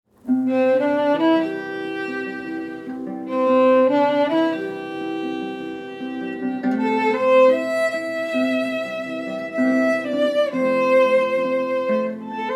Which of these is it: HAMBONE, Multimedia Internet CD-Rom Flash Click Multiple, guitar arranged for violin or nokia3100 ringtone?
guitar arranged for violin